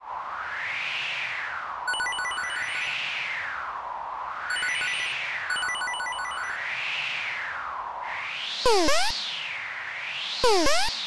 amb 8bit outro

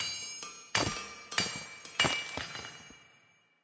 Minery work; Level3

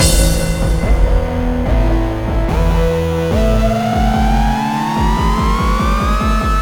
Stinger; Melting Chamber

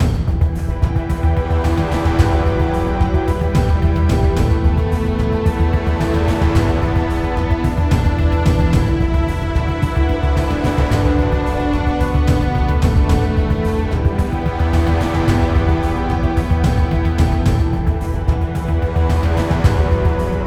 Bgm Comic

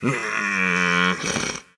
Zombie Idle

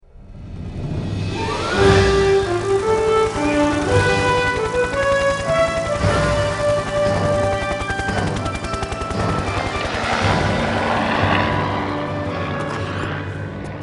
Win Game

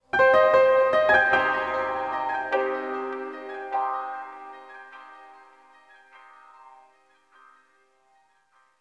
Oneshot Synth And Piano; zombie army 4 dead war ps4 stadia switch windows xbox one gamerip 2020

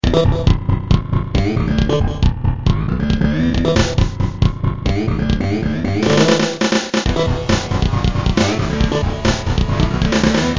Fanfare

